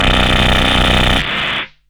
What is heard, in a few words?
CARTRASH